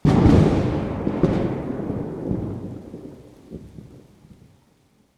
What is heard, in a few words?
thunder